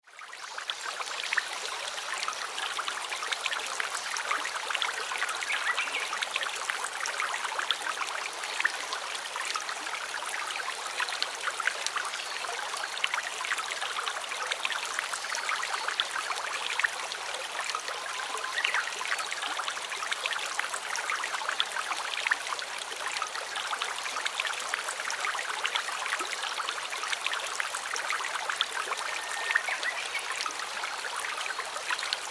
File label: River ambience water flowing gently and calmly